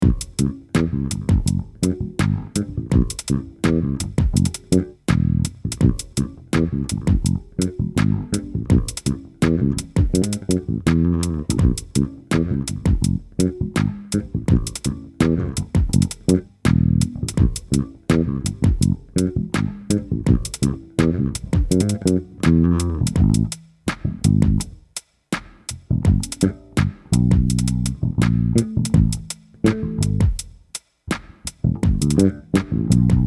Bass DI

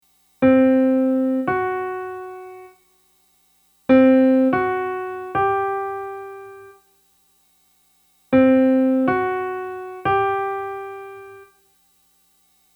Raised 4th, P515

